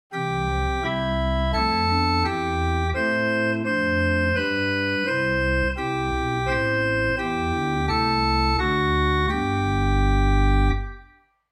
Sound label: Bw Ged Hw Hohl Okt Ses Ped Sub Prin; Grace Lutheran Champaign